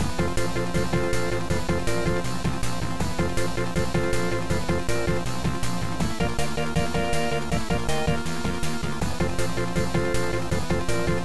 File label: Mayhem Rating Jingle; lemmings 3d pc